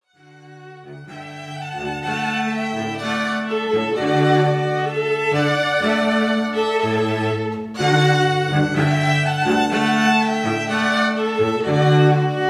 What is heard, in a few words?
trio ode to joy